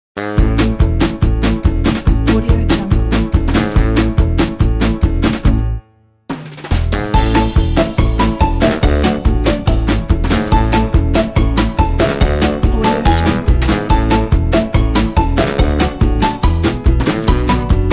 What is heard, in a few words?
upbeat funny